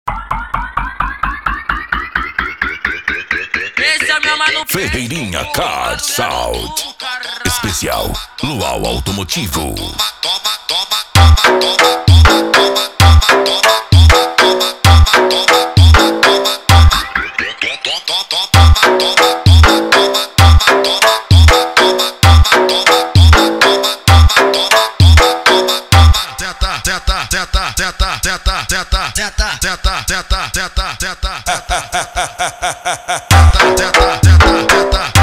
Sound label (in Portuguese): lual automotivo; ferreirinha car sound